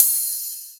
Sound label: Splash Long, Cymbal; One Shots; Drums; Code; Ableton Live 12 Lite; Core Library